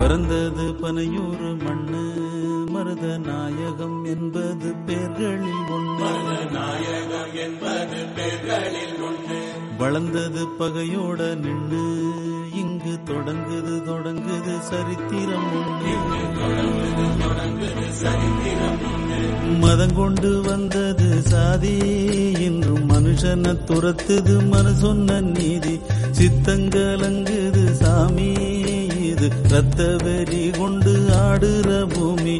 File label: bgm, tamil; virumandi; kamal haasan